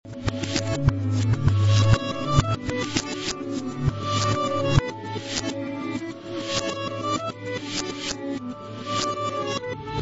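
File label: Guitar reverse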